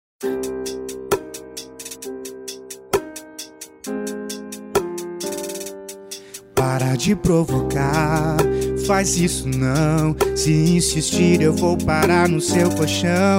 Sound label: trap